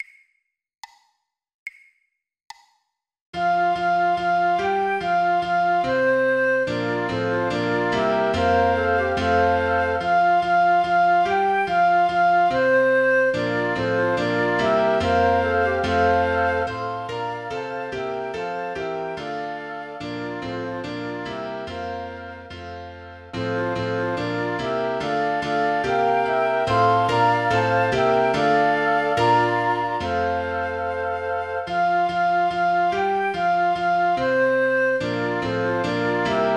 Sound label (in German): Flöte Klavier; Good King Wencelas; 144 bpm, 2x; weihnachten